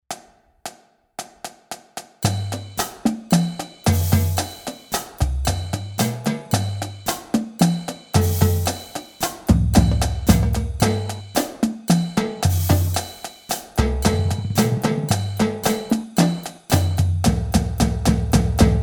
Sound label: Accent Tap 114, drumline exercise; Accent Tap 3